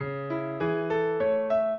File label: piano; Mozart